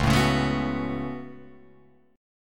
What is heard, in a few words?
C sharp 7th Suspended 4th Sharp 5th, C sharp, down, Guitar, Open F; strummed; chord